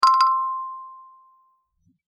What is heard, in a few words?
Notification ping